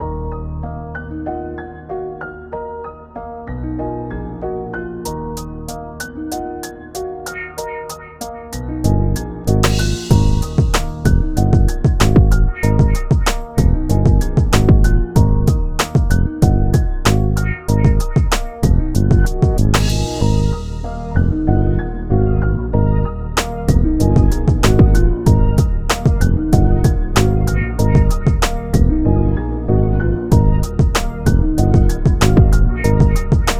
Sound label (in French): instru rap